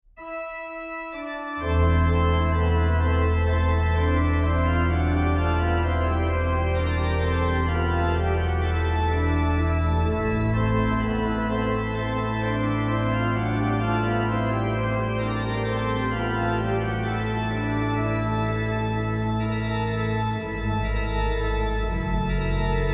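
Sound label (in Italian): toccatina, organist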